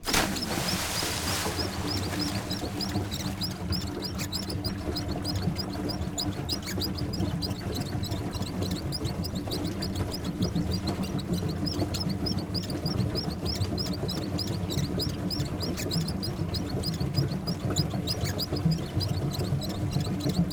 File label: Splash ride; anemoi